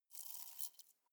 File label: hornet hum glass short